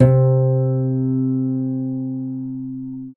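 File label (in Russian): арфа c4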